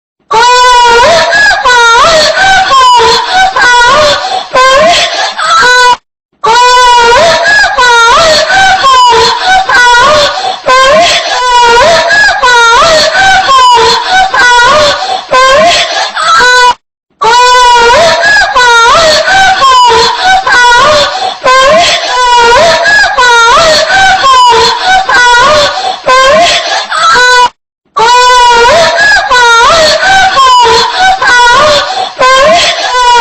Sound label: Gemidos de mujer Woman moaning sound effect; Efecto de sonido